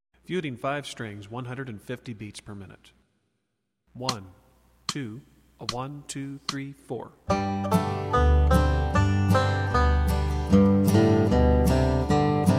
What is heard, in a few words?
Feudin Five Strings, 150bpm, banjo